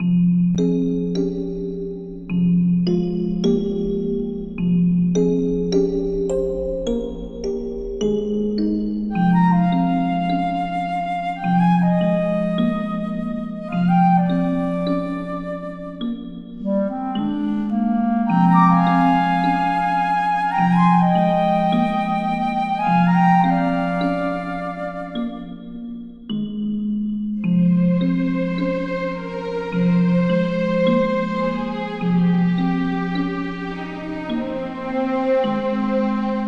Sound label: Forest Exploration; the prophecy lies